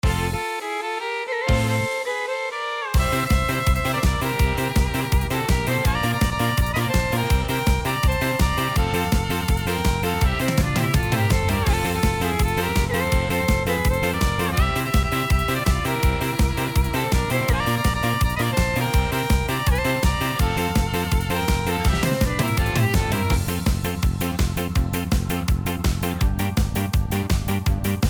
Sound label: Style Yamaha; yamaha tyros 5